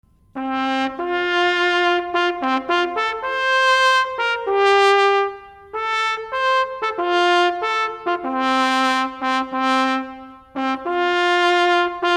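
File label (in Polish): hejnał sosnowca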